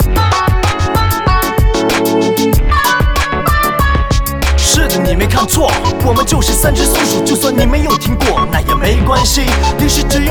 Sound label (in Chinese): 说唱; 三只松鼠